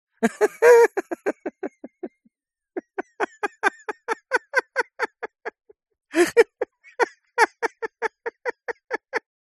human male middle aged laugh hysterical; zapsplat

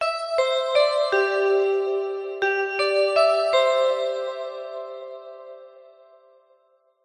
musical big ben chime synthesized bright bells; zapsplat

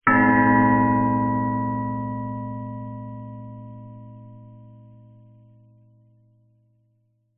bells buddhist chime ring

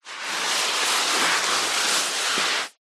human body clothed move shuffle carpet

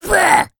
cartoon character spit out disgusting; zapsplat

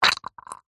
liquid gel squeeze short squirt wet

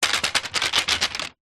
foley wood stick cane thick roll ground; zapsplat

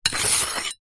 knife scrape dirt

